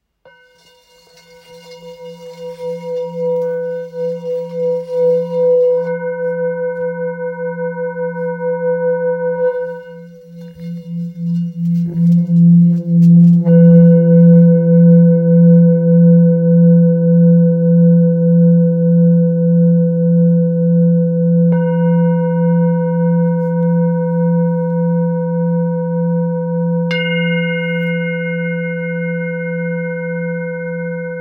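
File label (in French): Bol thick mi, bols chantants tibétains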